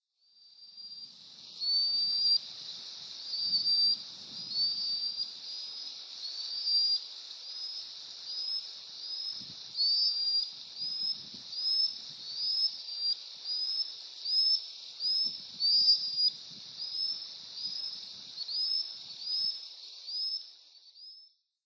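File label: Common Sandpiper; isoshigi; utsunomiya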